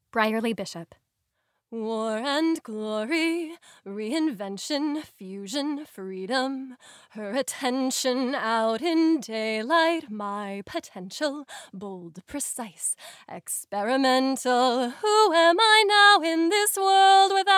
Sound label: Female Singing